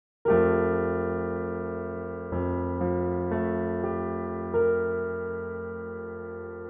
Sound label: 13 edo 45921 chord